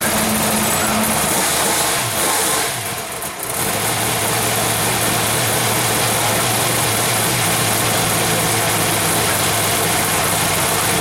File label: V8s Paddock; Bernies